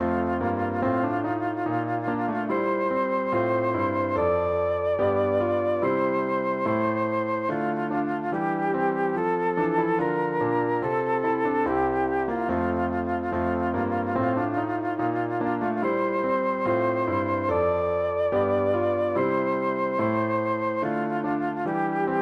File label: for flute piano; bach johann sebastian; o lamm gottes unschuldig